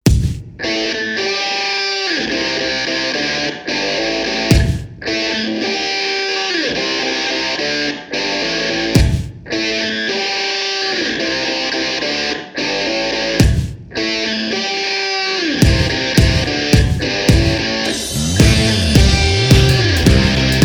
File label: okarina; sol